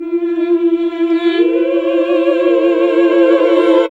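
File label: classical singing; Sound & Vision - Gigapack II CD 1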